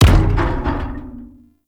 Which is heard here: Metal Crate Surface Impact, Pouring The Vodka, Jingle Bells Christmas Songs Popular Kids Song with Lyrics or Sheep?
Metal Crate Surface Impact